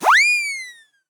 Run

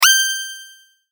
sword

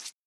Rip Up Paper

